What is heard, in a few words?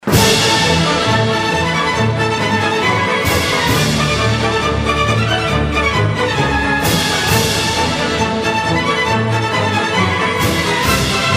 Carmen Ouverture